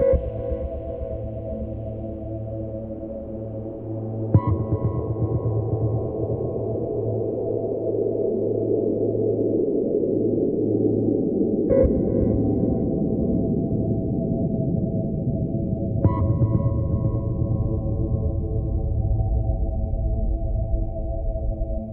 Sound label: Future World Loading Loop; Eternal Edge